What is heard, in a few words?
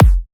FX Kick